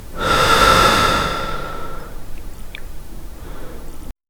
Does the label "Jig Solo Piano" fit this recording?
No